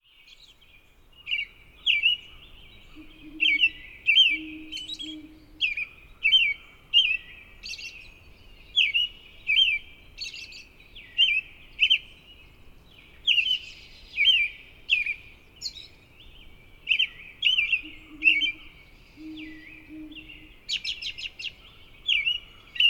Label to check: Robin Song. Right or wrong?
Right